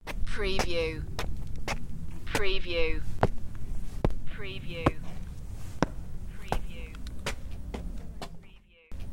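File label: Footsteps Concrete Steps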